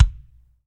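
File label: BD, bass drum; Best Service Gigapack 2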